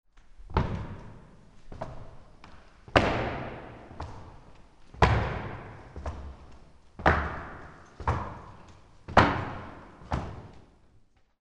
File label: FOOTFALL